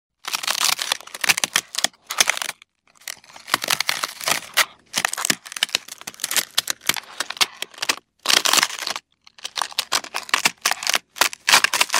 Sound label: egg hatching long